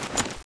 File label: draw, weapons; cstrike; guardian aug; buffaug